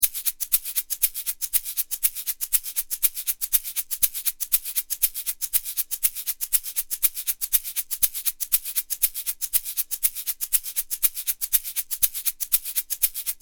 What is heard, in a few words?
shaker